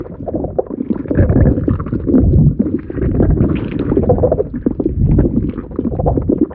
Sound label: Ability Spider Trap Hold Loop; Lurker; Evolve; Gorgon